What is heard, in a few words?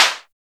MOVE PRC LO; TEK PERC2